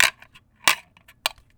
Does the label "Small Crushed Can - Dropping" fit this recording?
Yes